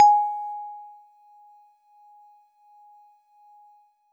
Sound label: Warble Celeste DX100 G#-1